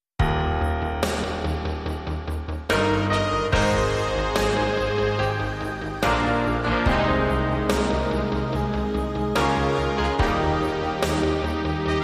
Synth

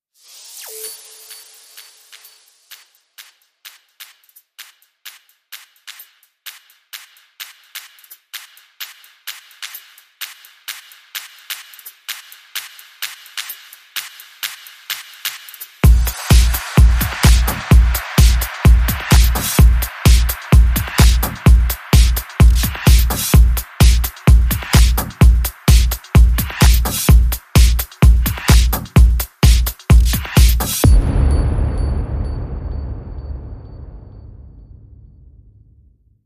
Beat

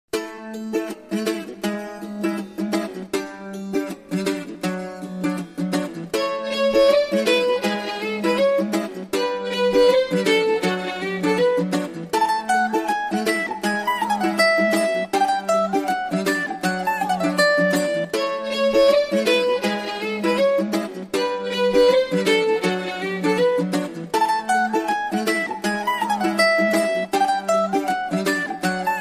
Celtics

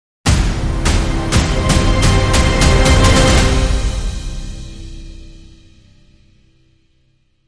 Dramatic Sting

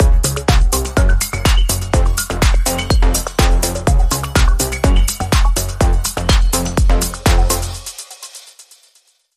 Link Sting

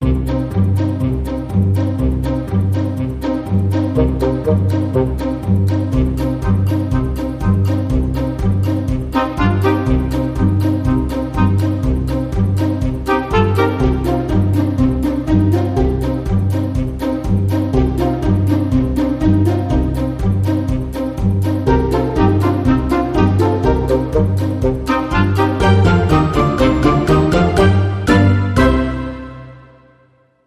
Traditional Percussion

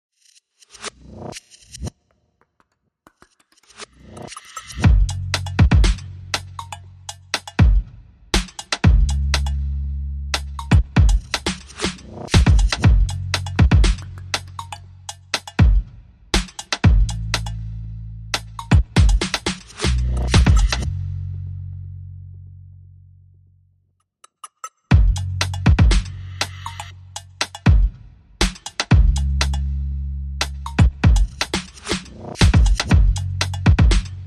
Drums and Bass

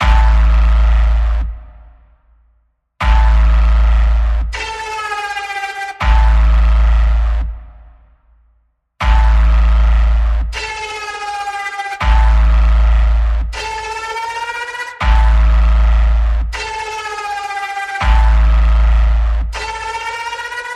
Mechanical Massacre Pulse A, Key of A

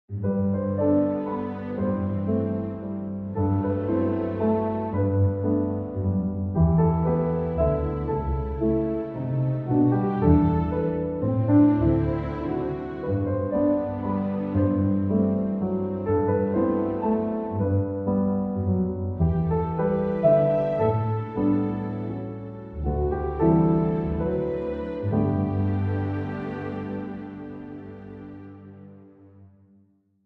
With Strings

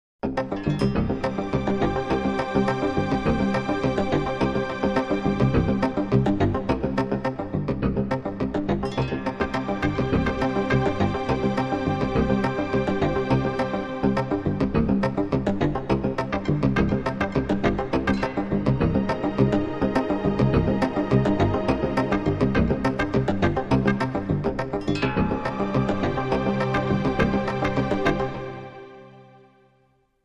Percolating Slightly Dark

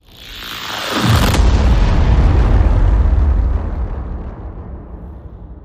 hit big critter

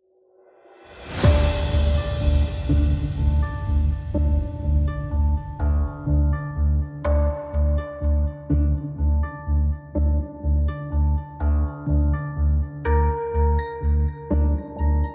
No Clock FX No Lead Strings No Piano

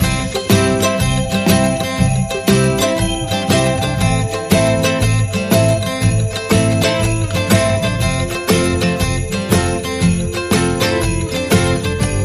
Happy Christmas Ukulele

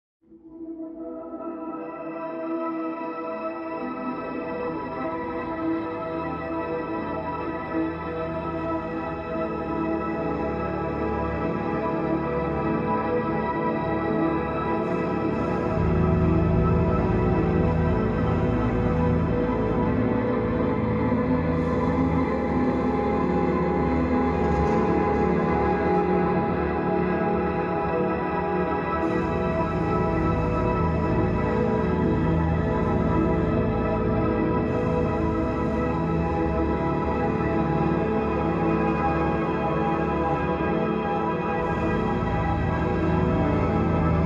Basic Mix

